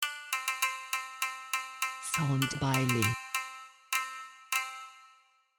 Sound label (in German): Tamburin Shamisen